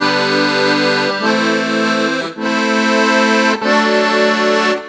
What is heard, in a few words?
Accord 098bpm G, Accordian; Quazibeat - African Secret